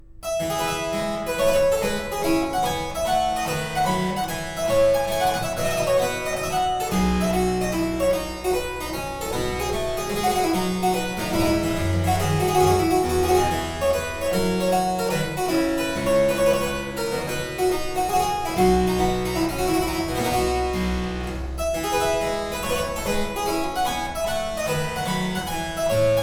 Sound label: Courante, Harpsichord; F Major; Purcell edition; Suite No.8 In F Major, Z669